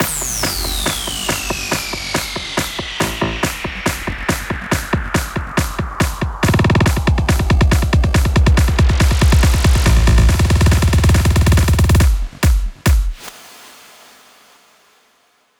140BPM 8 Bars, HandsUp & Trance Fills; Teamkiller; Vengeance Samples

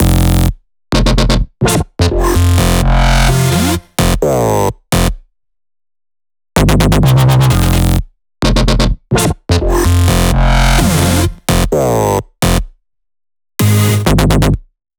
128BPM Melody Root F; Spring; Vengeance Samples; Spring Kit

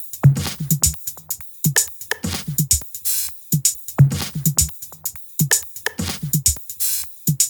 128BPM Kit; Vengeance Samples; VFH1 128BPM Contradiction Kit

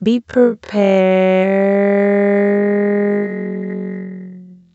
Vocal Phrases; Vengeance Samples; Vocals

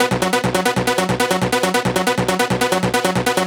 138 BPM Sequence Fill In DRY, B minor; Melody & Synth

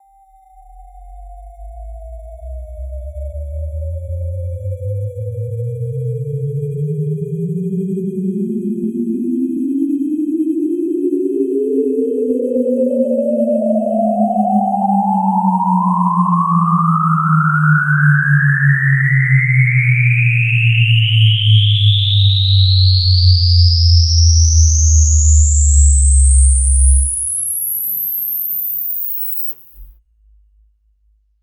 128BPM Spooky; FX Fills; 16Bars; Spooky 3; Vengeance Samples

